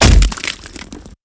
mortar; gonarch

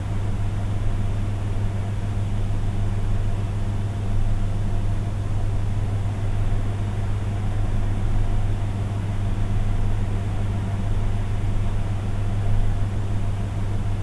basement wind

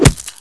slave strike; aslave

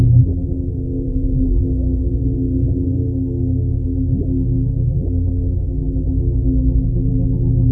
drone empty space

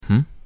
hmm

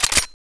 ppk cock

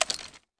Mp7 reload

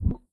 jump

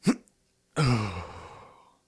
Morphine Injection; police

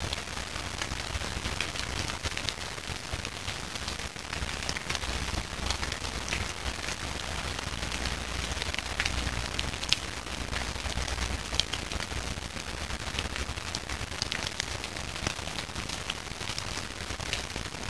rain leaves

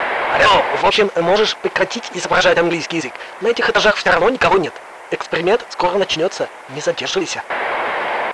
radio

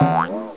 ball bounce

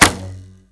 trip cord

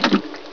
rat step

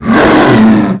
growl big; ghouls forest2; growler

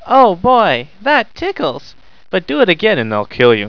tickles

